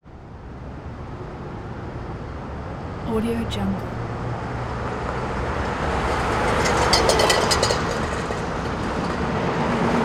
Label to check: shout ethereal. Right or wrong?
Wrong